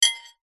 household crockery ceramic hit with fork light scrape